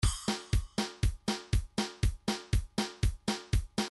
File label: country 2bar